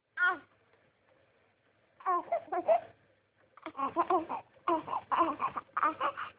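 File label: baby hungry, female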